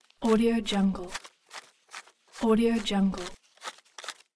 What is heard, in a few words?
money counting; legatopromusic